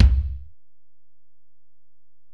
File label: Ac BD, Alesis DM-PRO; 300 Drum Machines